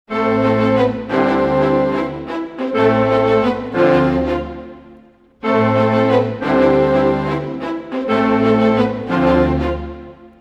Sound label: 90 a min 4 bars full mix, orchestral arrangements